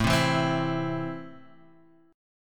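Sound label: A Minor, Guitar Standard, chord strum; Am strummed; JGuitar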